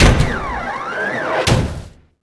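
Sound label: gladiator death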